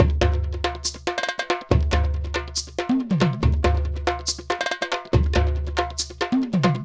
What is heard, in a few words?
140 Mixed Perc